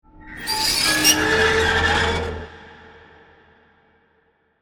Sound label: Metal Scrape Gate, Production, Element, Metal, Scrape, Gate, Reverb; Warped; BlastWave